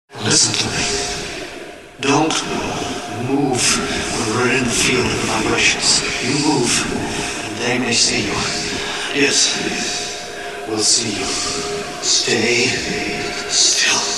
Alien Warning wet; Crowd Chamber

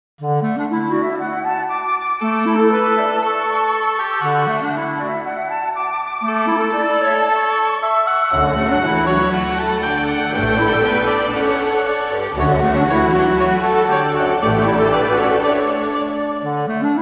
Concert Orchestra Large; RaySpace

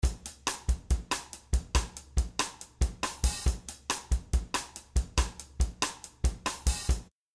Percusion Peak EQ DRY; RaySpace

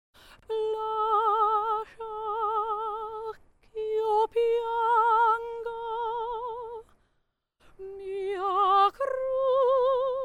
Opera Cathedral DRY; RaySpace

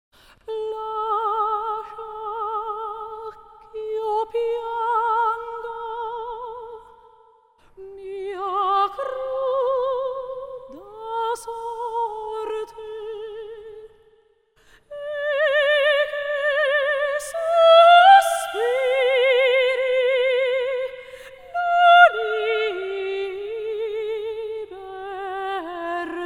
Opera Cathedral; RaySpace

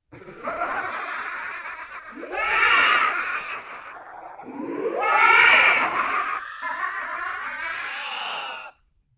Crazy Laughing wet; Crowd Chamber; Crazy laughing FX

